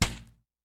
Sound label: Obstacle Oven Turnoff; In Obstacle Oven Turnoff